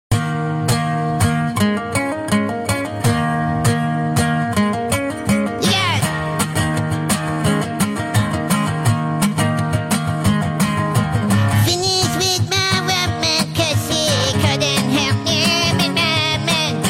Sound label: singing; Cartman